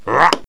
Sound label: daemon attack; voices